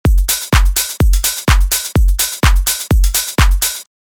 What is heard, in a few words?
Tech House Loop